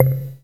Snare, Korg Z1

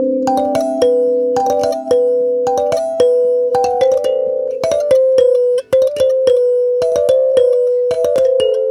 Kalimba, African

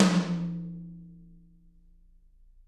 TT, Toms; Bob Clearmountain Drums I

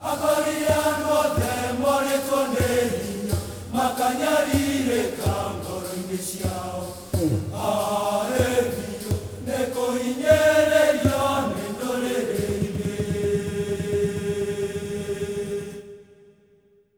Heart of Africa; Spectrasonics; Heart of Africa 2

